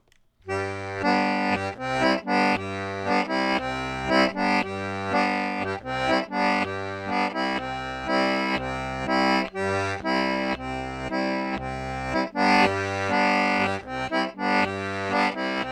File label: Left Hand at normal speed; Back Door